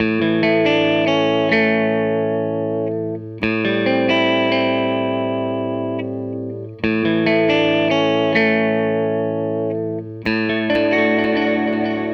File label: Les Guitar Loop